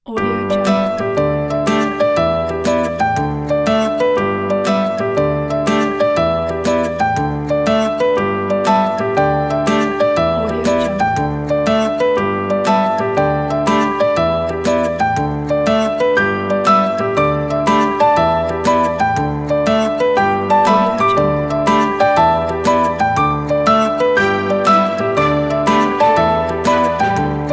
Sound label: quirky and funny